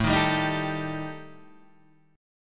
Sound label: G Suspended 2nd Sharp 5th